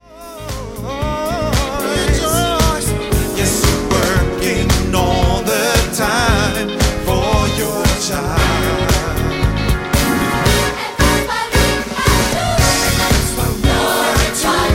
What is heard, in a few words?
Choir